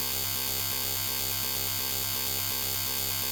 Sci Fi Laser Discharge Beam Laboratory Equipment Science Fiction; Frogsong